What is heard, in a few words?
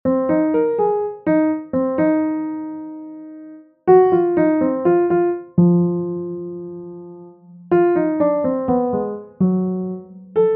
bossa nova